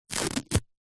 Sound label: household plaster band aid peel from skin